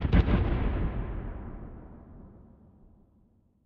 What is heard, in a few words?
landing gun explo; smite; Ares